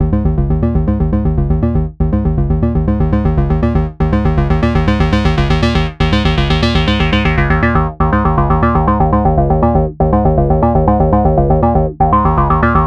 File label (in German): Oberheim SEM VCF Cutoff Resonanz Filtertyp; G-Force Software